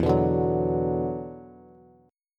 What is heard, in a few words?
E 7th Suspended 2nd, down, Bass; E7sus2; strummed